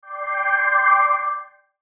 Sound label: Logo Shimmer Swell; Sonic Logo; Blastwave